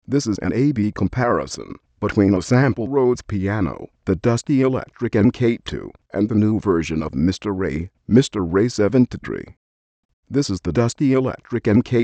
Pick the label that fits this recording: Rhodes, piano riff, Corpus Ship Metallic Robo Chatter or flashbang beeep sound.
Rhodes